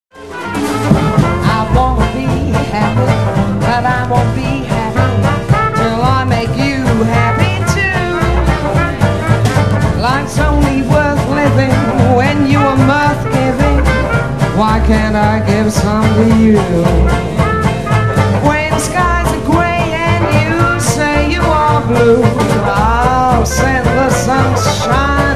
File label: Jazz Band